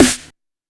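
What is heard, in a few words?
TR909 Snare, Roland SH-32 Drumset; Drumset 03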